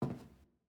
Footstep Hallway

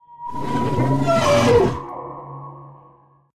Nonverbal

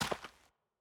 Footstep Dirt

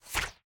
UI event popup; bloom minigame

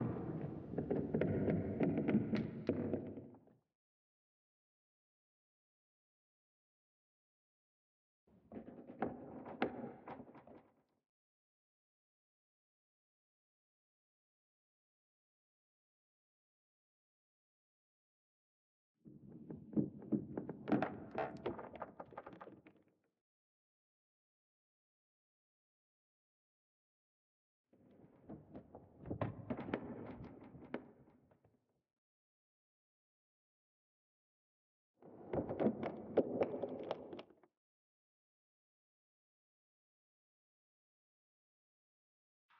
Misc Wood Creaks

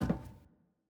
Footstep Metal

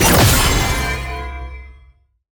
attack dash heavy; bloom minigame

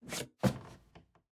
Footstep Lab Rotate

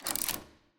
Lock Dial Up; Puzzle

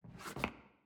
Footstep Hallway Rotate

